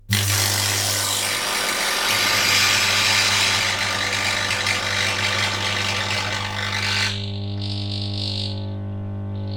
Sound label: Arc Welder Long